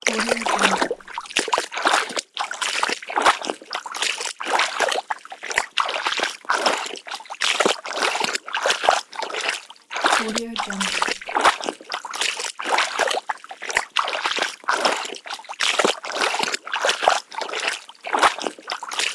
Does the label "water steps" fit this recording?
Yes